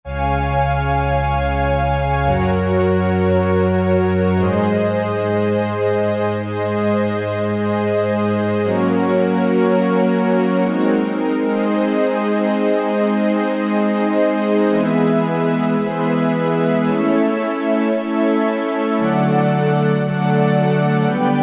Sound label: Juno 106 Pad, vintage synth; Roland SR-JV80-04